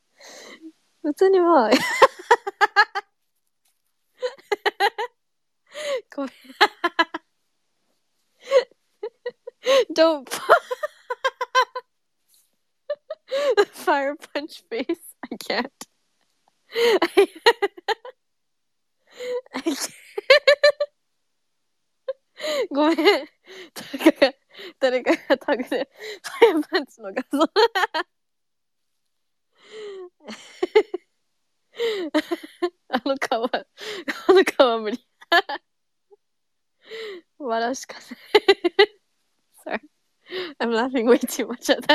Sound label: blessed laughs; SpaceRyS